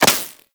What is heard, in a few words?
Laser Impact Default; Warframe; Weapons; Bullet Impacts